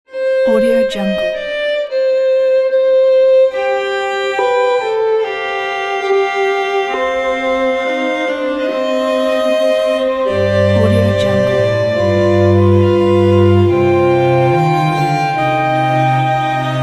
concerto for strings in c minor largo; vivaldi

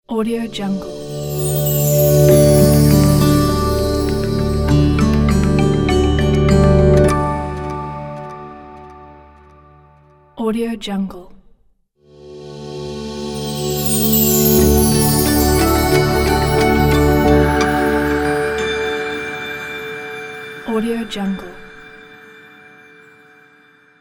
pure airy logo pack

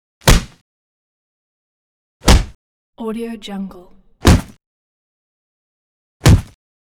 phone book hits multiple slams smacks

